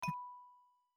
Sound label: Chip Landing; My Cute Commissar